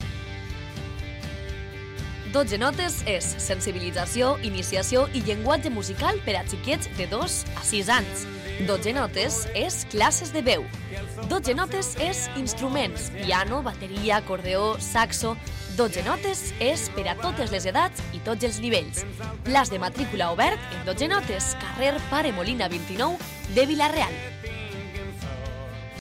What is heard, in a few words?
12 notes